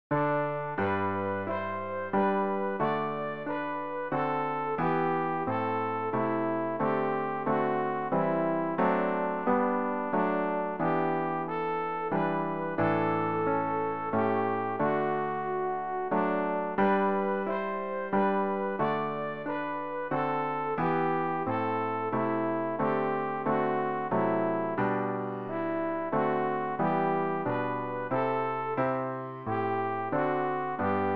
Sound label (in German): sopran; Ref. Gesangbuch; RG 605: Der Tag, mein Gott